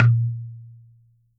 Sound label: coconut; Unique Instr